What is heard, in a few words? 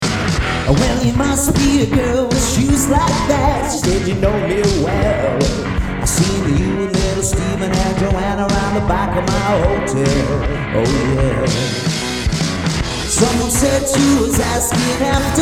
Full Band Samples